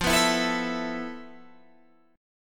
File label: F Major 7th Flat 5th, Guitar Open G; down; chord shape